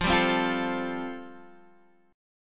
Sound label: G 9th Suspended 4th